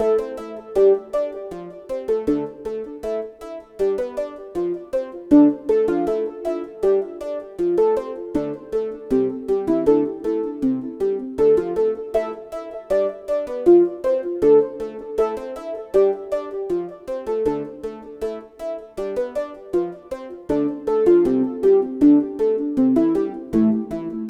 Digital Introspection, introspective emotional music